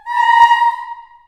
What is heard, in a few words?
A#4 CHS EH, CHILD EHS, Choir; USB Soundscan vol.28; Choir Acoustic & Synth; AKAI